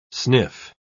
sniff

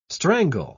strangle

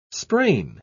sprain

